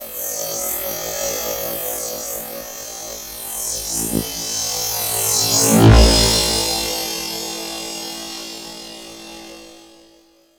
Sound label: Electronic Pad; Sonic Foundry (Sony Creative Software); Electronic Point Blank